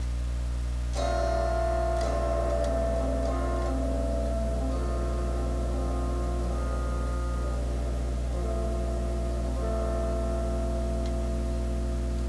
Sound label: guitar